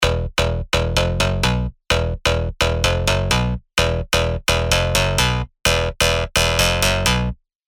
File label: Robotic Mode; revealed serum 2 dirty dutch vol 1